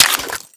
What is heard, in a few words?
mag out, m92fs; wpn; svencoop; arctic incident; ins2